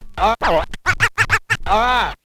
scratch kit, vinyl

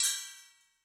Metal Dip; Yamaha DTXpress-II

